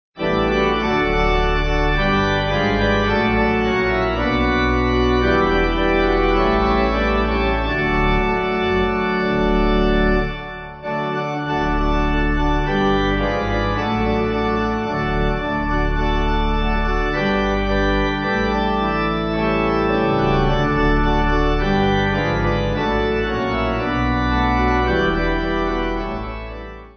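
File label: Pipe Organ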